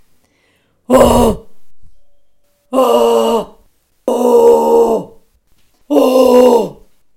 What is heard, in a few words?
Grunt